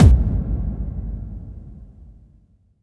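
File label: bass drum FX, dance drums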